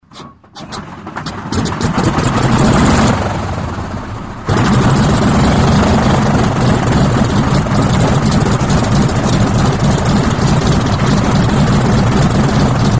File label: yak11 start